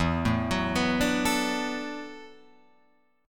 E 7th Suspended 2nd Suspended 4th, down, Guitar, Open F; E7sus2sus4 arpeggiated; JGuitar